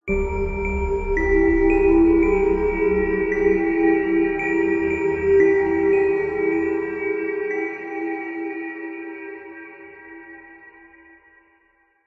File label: horror ghostly musical riff nursery rhyme